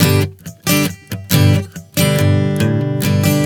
Strum 070 G, One Chord Strumming 070 bpm; Sonic Foundry (Sony Creative Software); Songwriter Acoustic Guitar Companion

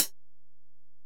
HiHat, Alesis DM-PRO

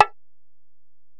Ac Perc, Drum Machines Alesis DM-PRO

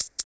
RSS PERC

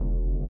LIQUITAR; Spectrasonics; Metamorphosis

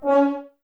Brass F.Hrns C#; Miroslav Vitous - Woodwind Brass Ensemble

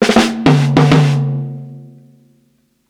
60'S STYLE 100 TOM FILL, DRUMS LOOPS; BASEMENT DRUMS VOL 2

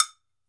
TOKE BEL, African Drum Percussion; Drums&Percussion; E-MU Producer Series Vol. 7 – Old World Instruments

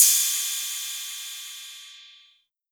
808 Cymbal; Novation Drumstation

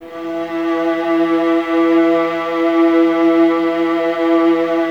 violas E 3, String Ensembles; Miroslav Vitous; VLS 1+2

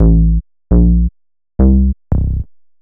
Bass, Futurist Drum & Bass

